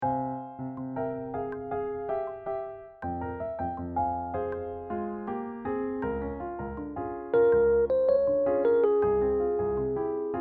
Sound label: duet, jazz